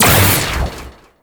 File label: Metronome; Amalgam Moa; Abilities